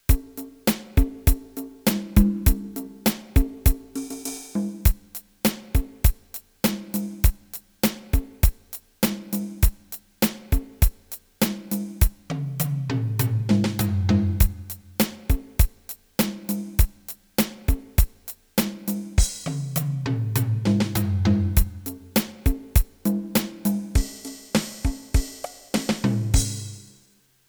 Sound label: startone mk-200 beat pop